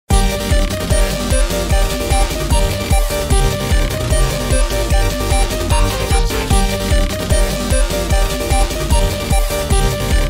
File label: Game Beat